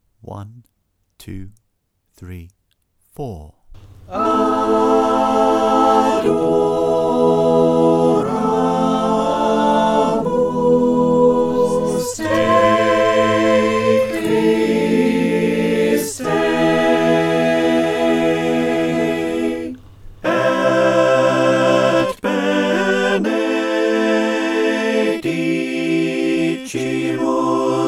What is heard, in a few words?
SATB, choir